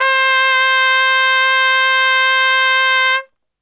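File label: C 3 trumpet straight, brass; Best Service; Brass Super Section; TRP STRGHT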